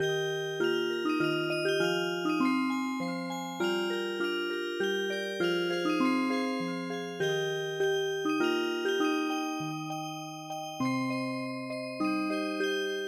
Music Box; gayaeum ta ryeong

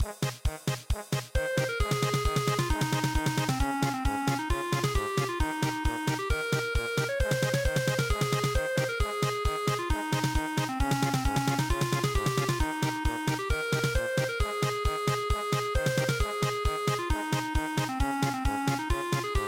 polka

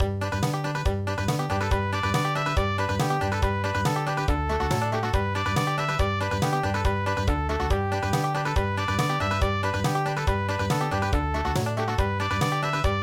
country, midi; Oh Suzanna

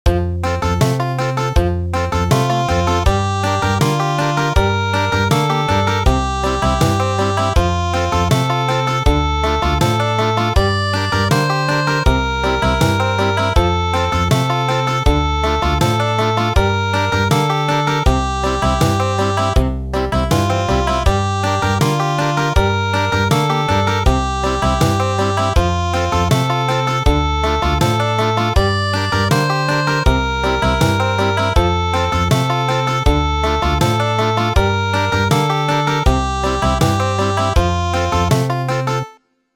Country

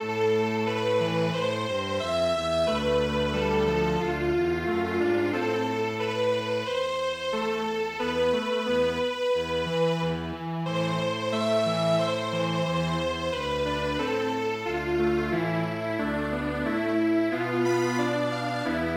Strings Quartet; full moon

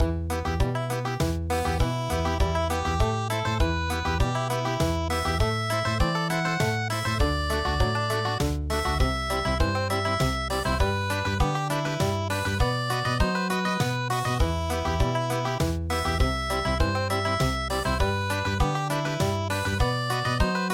Country

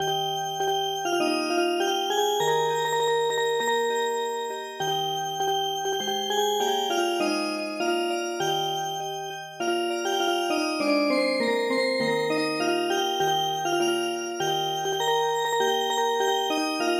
yokohama city song Music Box

